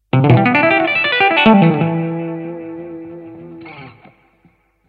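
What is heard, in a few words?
Lick, MODERN TAPPING; guitar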